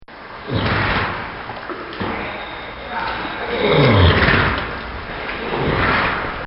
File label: Swimming Pool Therapy For Horses; Bobby Cole